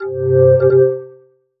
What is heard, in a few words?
NFC Initiated; effects; khadas edge2